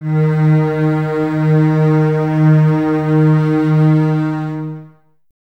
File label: BSS D#3, String Ensembles, Basses, f Full; Miroslav Vitous; 90 s Sample CDs